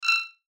Beep Tone Signal Multimedia

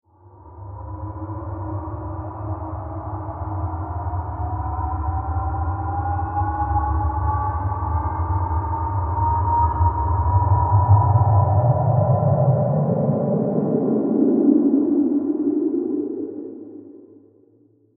Long Accelerating Science Fiction UFO Spaceship; Crafted Signals

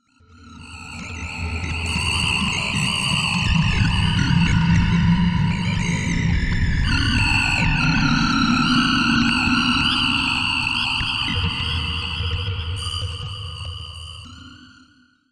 Eco Planet Ambience, sci fi music; science fiction sound effect; star wars / trek style

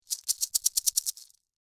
Rattle Snake, Animal, Rattle, Snake, Shake, Tail; Animals; BlastWave

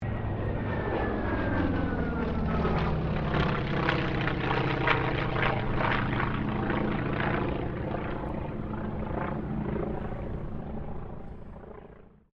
AIRCRAFT T-28D TROJAN PASS DISTANT; Airplanes (Sound Effects:Vehicles)

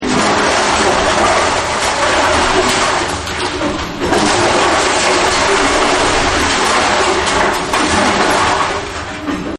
Metal Waterfall; Sound Effects:Materials; Partners In Rhyme

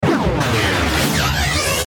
Power Up Energy Build Up Glitches; Rise And Dive; Blastwave

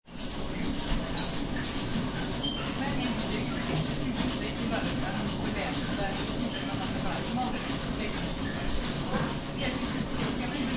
Gym Indoor Small; Basketball; Sports; Lynne Music

